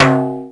Timbale, Roland S50; Drum Samples

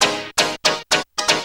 stabs, audio loops

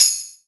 Tambourine, Yamaha RX-5; Drum Samples

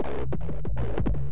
sliced version 184 bpm

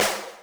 snaredrum, Yamaha RM 50

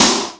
Snare, Yamaha RY-30 SNARES; Drum Samples

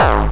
bombo, kicks, Hardcore

